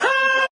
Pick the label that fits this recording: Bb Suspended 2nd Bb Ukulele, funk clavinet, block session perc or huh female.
huh female